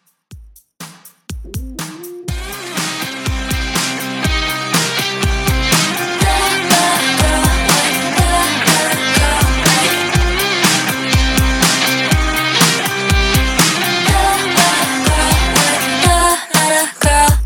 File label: Dance, Top40, 122 BPM